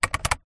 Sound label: transport car fan speed dial click turn toyota corolla